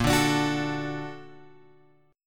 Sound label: A sharp Augmented Major 7th A sharp, chord; A#+M7 Chord {6 5 X 7 7 5} strummed